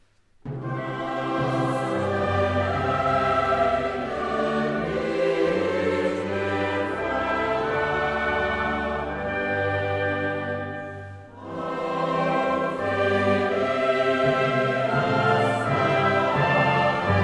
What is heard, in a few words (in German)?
Choral